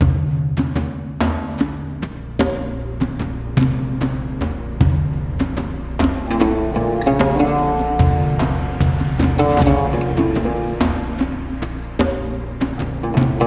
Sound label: Calm; sonic branding